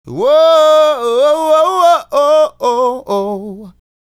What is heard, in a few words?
Male Solo, Vocal